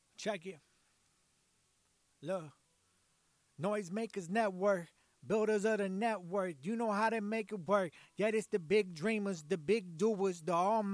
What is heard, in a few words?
Noise Makers HH 88 Bm